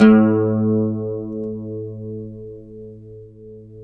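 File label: pluck OUD A; Roland - LA Composer vol. 3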